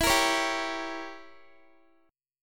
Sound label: E Diminished 7th E, Guitar; Edim7; strummed